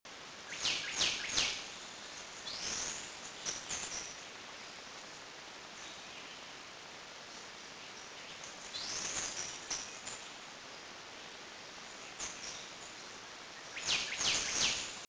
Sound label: loxigilla portoricensis florida puerto rico; West Indian